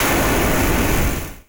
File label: attack